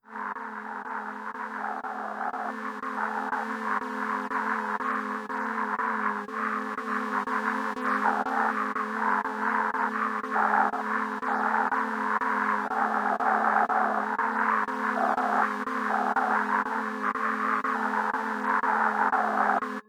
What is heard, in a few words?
insect noise; chromaris